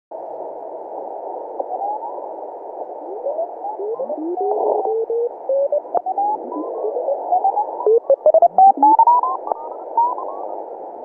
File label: 1.1khz; filtry k2